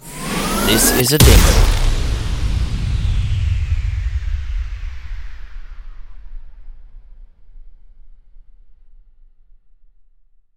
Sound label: Rise Boom long tail